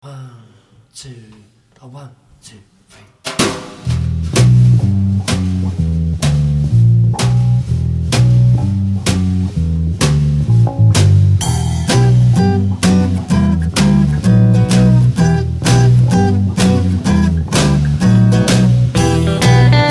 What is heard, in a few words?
Jazz Club